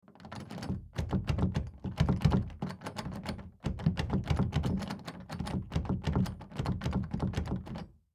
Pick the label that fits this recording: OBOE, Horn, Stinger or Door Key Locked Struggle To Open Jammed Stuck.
Door Key Locked Struggle To Open Jammed Stuck